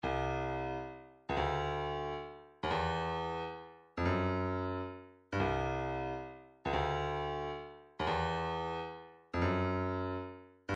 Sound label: 165 maraca, midi